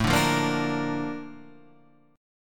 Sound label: Bb Major A, chord; Bb/A strummed; JGuitar